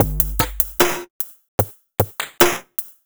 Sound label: Break, Drum N Bass Percussion